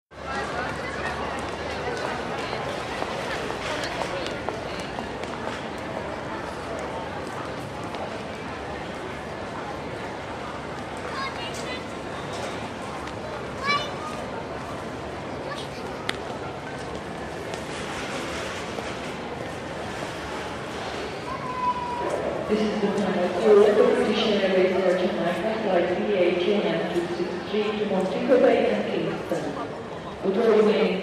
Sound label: Airport Lobby Crowde